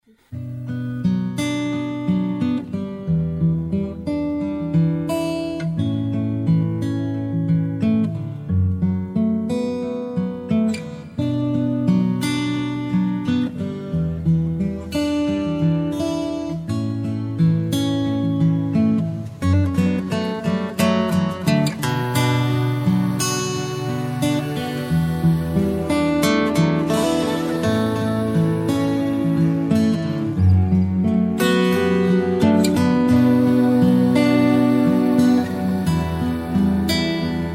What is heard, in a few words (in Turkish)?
Fon Muzik; santralseslendirme